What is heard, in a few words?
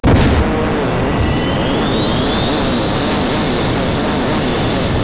Rocket, thrusters; 16bit